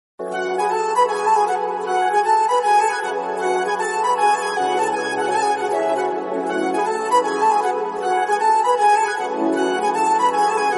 telugu ringtone south ringtones

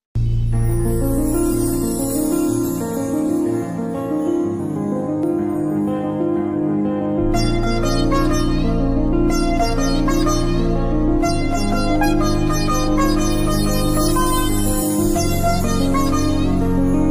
lovely ringtone bgm, background music; ram pothineni

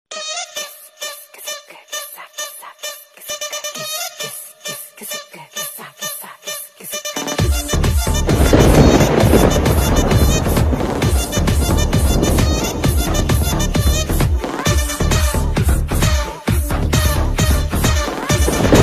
song bgm ringtone tamil ringtone; pushpa 2; av ringtone

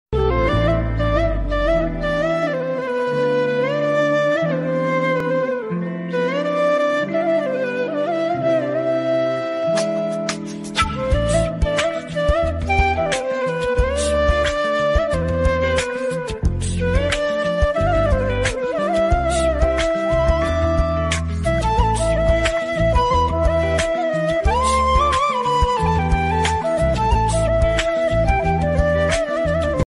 bgm

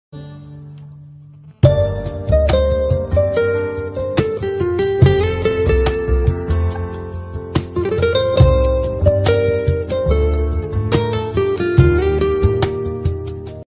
instrumental ringtone instrumental bgm ringtone

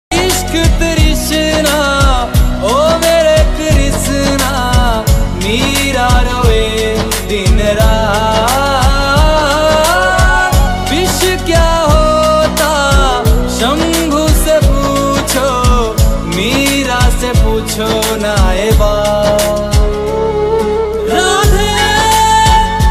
song ringtone

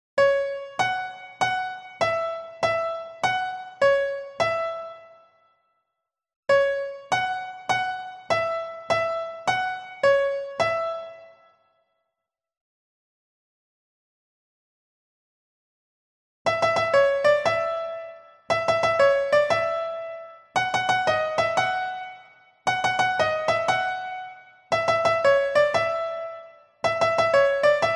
red light green light ringtone mobile piano tune

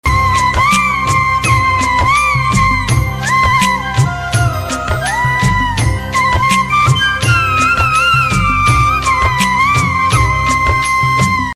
song bgm tamil

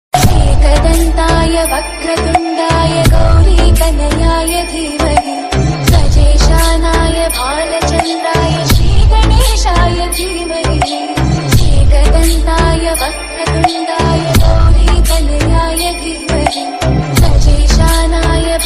ringtone ganesh song ganesh ringtone

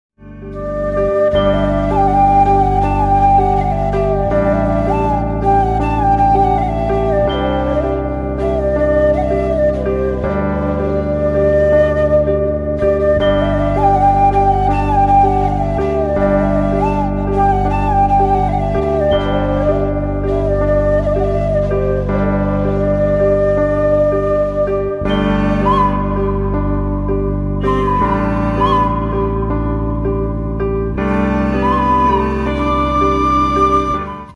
ringtone hindi ringtone new mobile ringtone; kesari

